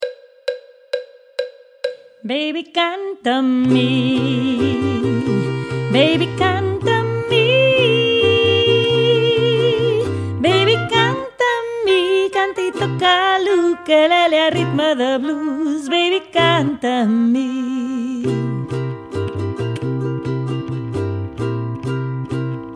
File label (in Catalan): Baby canta amb mi